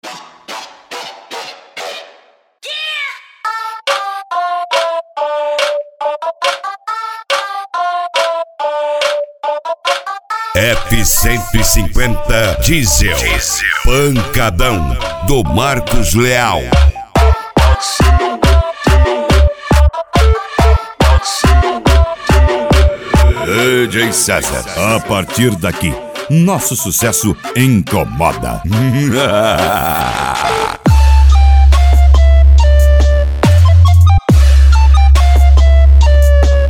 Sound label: pancadao